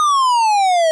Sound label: Fire Alarm Loop